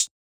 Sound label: Closed Hats